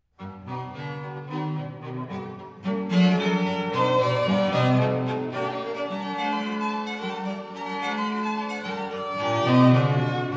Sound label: String Quartet In G Minor - Tempo Di Minuetto; Op. 1 No. 3 - III.; String Quartets; Joseph Martin Kraus; Flute Quintet & String Quartets